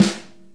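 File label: snare A3, multisampled real kit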